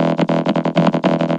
Vocobeat; Sonic Foundry (Sony Creative Software)

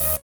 SYN DANCE; Sound & Vision - Gigapack II

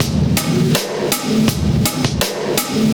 Brittle, Drum Loops, Compressed; Zero-G Smoke

